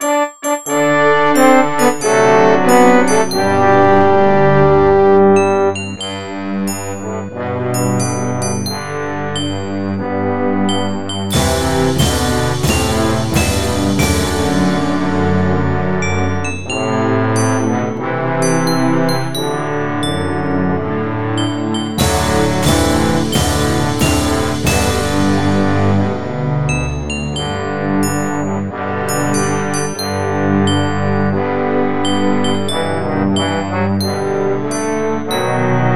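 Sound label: Indonesian Anthem, MIDI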